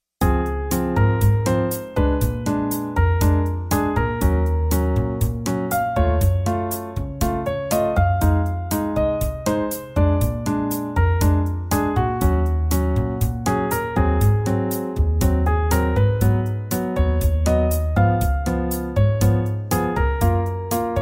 Bossanova

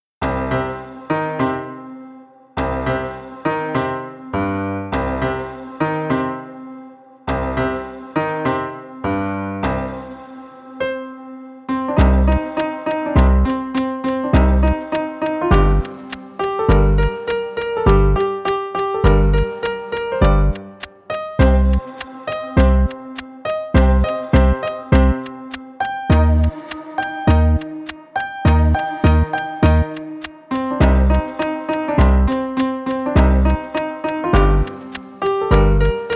Agressive Piano; Bright POP Medium

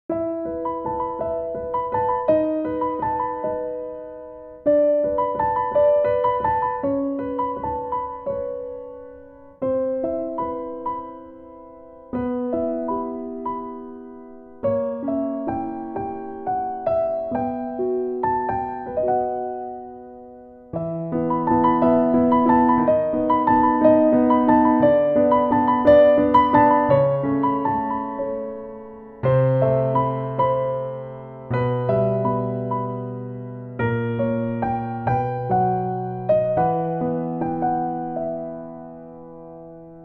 Sad Music

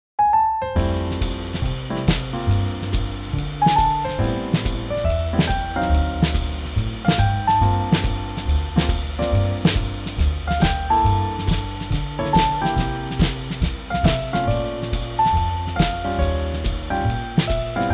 Swing Jazz

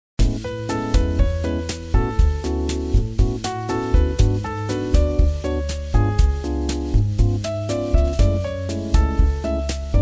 Bossanova

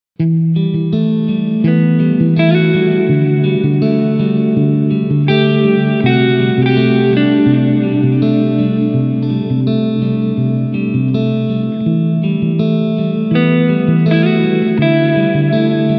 Workplace; 감성 BG

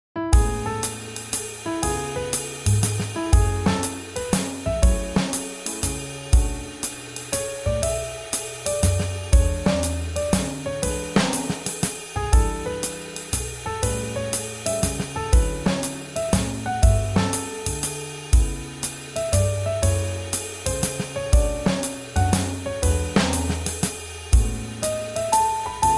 Swing Jazz

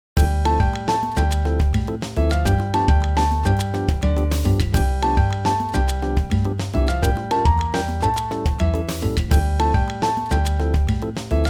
Latin Jazz

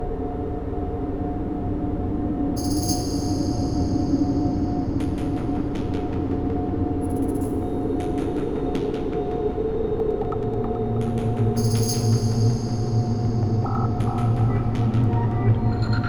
Horrormask, Tension 공포

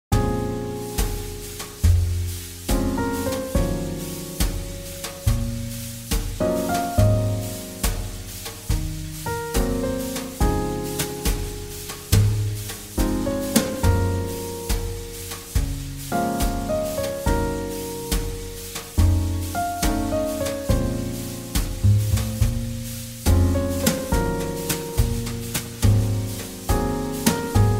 Jazz Ballad

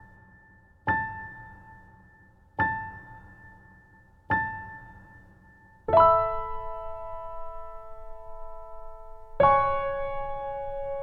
Trap; 다큐 (자연다큐)

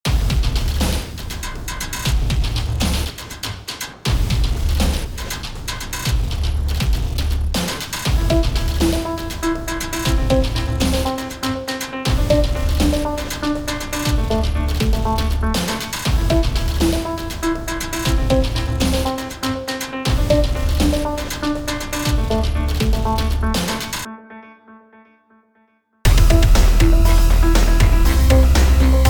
Chain Saw, Tension

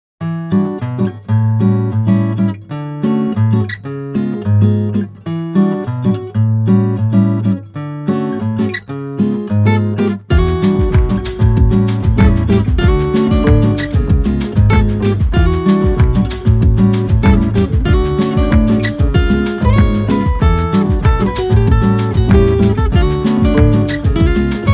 Bright Latin

